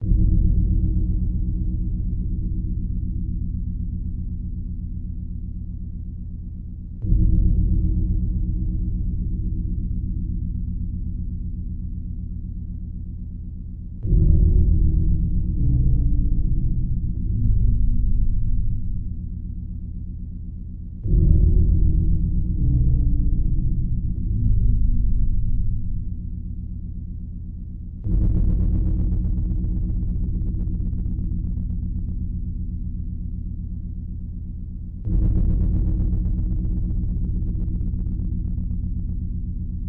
music; svencoop; mistake coop

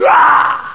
death, monsters army; svencoop; quake1

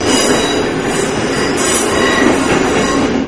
train track; svencoop; hc2

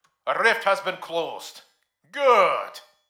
rift closed; svencoop; mustard; maxwell

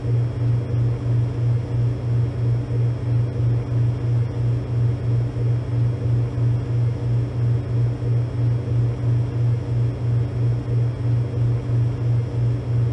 soda machine; svencoop; lostmaze

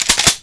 cock, weapons; svencoop; echoes

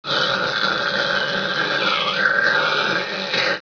attack, controller; svencoop; cracklife

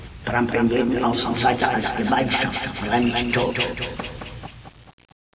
win, nihilanth; svencoop; cracklife

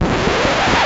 scratch; turkmusic

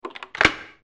unlocked; svencoop; lostmaze

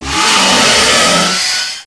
gohma attack; zelda

